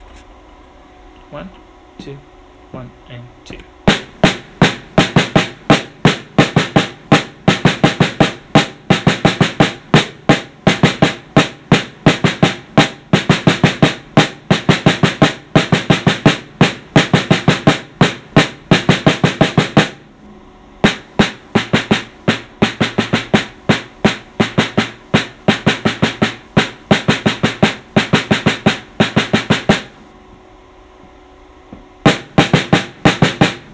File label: snare drum, solo; Cadet Band